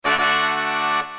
Puzzle Collected; Santa's World; ps4

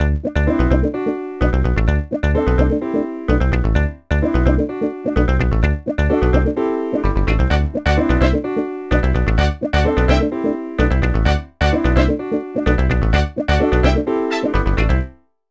Punky Funky

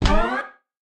Orb Get; Smashy Road Arena android ios 2016

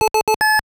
Pause, Item Appears; BurgerTime

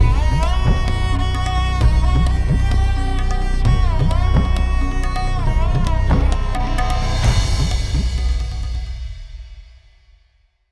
Win Game; homeworld deserts of kharak gamerip 2016

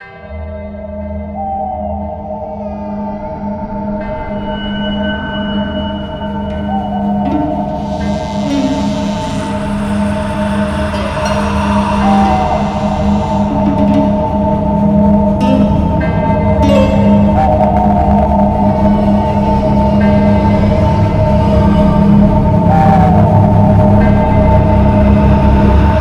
Horror

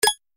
Game Navigation

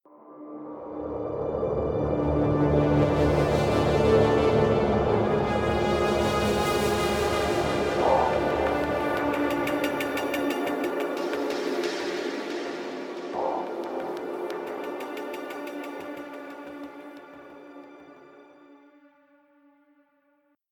Stinger; Legacy

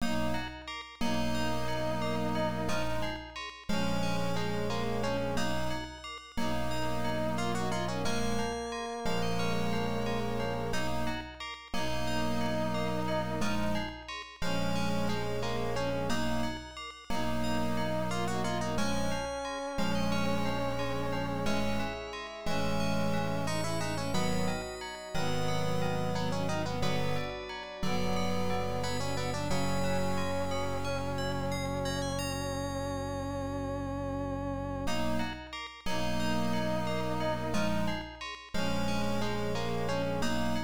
Light Room; rusty pc-9801 opna

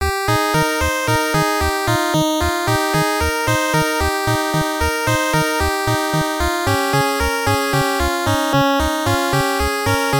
Success

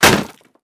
wood

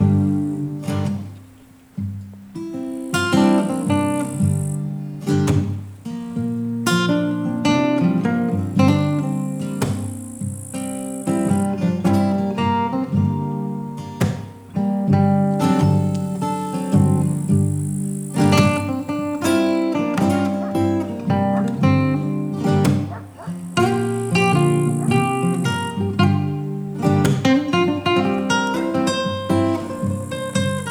Menu Music; Kentucky Robo Chicken

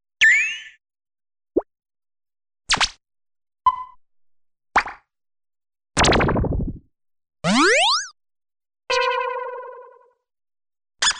Sound Effects; Puzzle Bobble; puzzle bobble variety

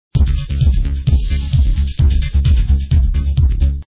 Combat Music; pencak silat 2002 gamerip online

